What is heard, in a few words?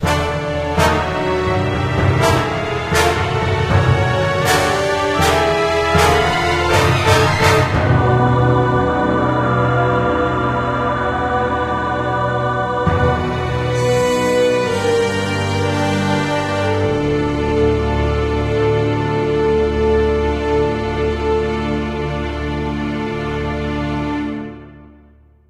Final Battle End, music; Thailand